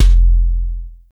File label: kick, Roland R-70, BD